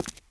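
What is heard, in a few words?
boot wood walk right, footsteps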